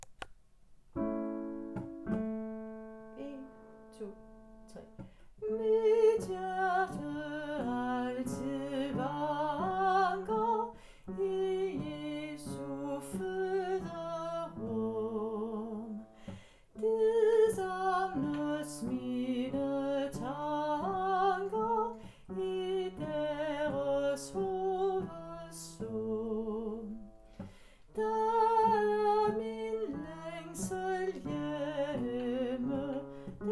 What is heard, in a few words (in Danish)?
BAS med sang